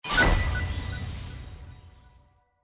scatter Wins; Miles And Millions

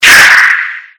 SLIDE ENVELOPE; slots; Wealth Gods

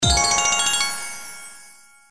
Win Symbol Scatter; slots; Valentine Blast

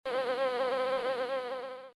bee Flying; Cash Honey

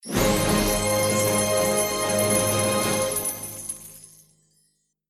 Big win; Wealth Gods 2

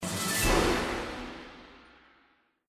SFX PRE FS, SLOTS; Wealth Gods

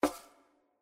Reel Spin Stop, slots; Bombo

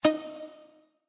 regular click; SLOTS; BUTTON; Cyber Angel

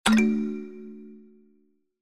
click spin; Zodiac Millionaire

